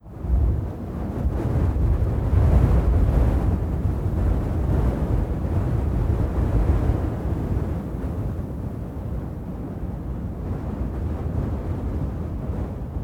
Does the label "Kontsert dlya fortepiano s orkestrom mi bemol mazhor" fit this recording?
No